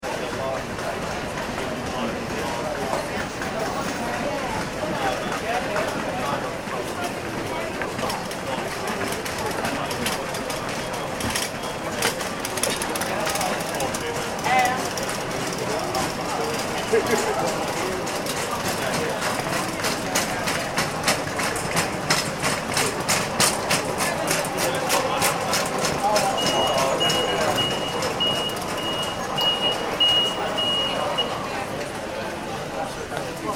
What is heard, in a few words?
ambience airport arrivals hall; heathrow; McKinney Sound